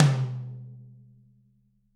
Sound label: TT, Toms; Bob Clearmountain Drums I